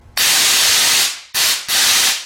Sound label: Nitrous Purge